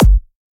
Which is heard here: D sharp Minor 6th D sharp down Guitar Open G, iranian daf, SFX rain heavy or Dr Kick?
Dr Kick